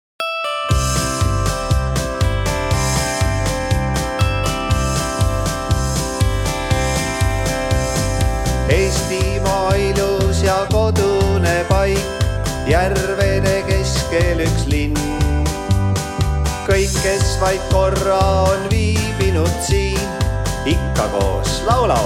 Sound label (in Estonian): Elva mändide polka; kandlemuusikat; kannelt